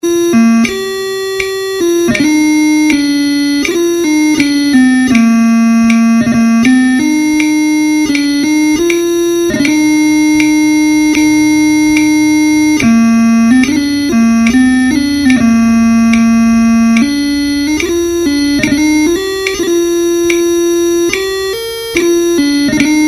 Change-Rose of Allendale 80bpm - Cullen Bay 72bpm, pipe band; highlanders